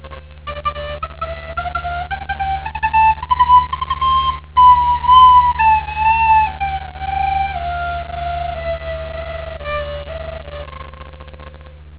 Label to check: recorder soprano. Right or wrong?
Right